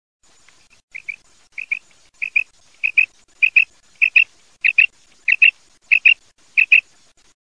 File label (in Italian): QUAGLIA FEMMINA